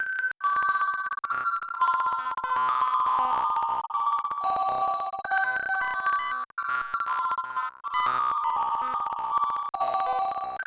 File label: instrument layer; lead arp chord melody